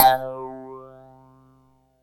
WA CLAV; East West - Phat & Phunky

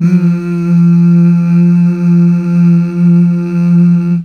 Mmh Male, CH BACK; Best Service Gigapack 1

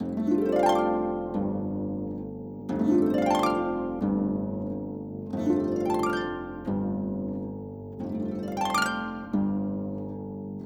Harp, Orchestral; Sonic Foundry (Sony Creative Software); Orchestral VOL-2; Modern 25

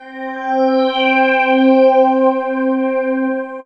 Pad; Dream Zone

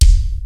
SPD-8 KIT; Rubber Chicken Software Sample Bank; DRUMS + PERC

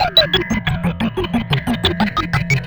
(arp) serge; Sonic Foundry (Sony Creative Software); Ugly Remnants VOL-2

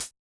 HH, Yamaha DJX 2B

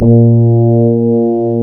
A#, Synclav FM, BRASS; Big Fish Audio

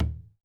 MADAL, DRUMS OF IND

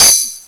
TAMBOUR K, Ragga & Dub Reggae

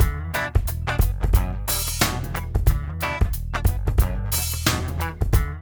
MIX, Funky Ass Loops; East West

